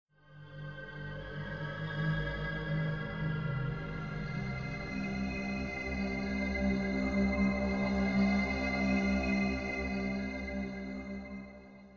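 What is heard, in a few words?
melody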